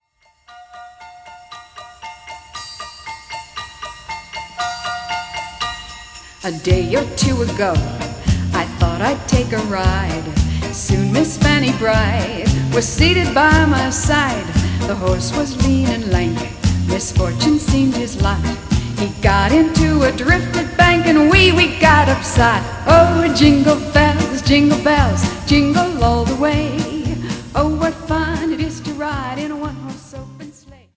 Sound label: Jingle Bells